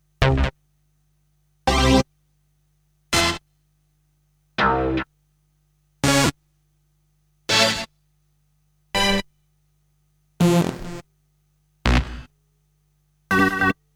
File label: Stabs; Kniteforce; 1000 Samples Volume 1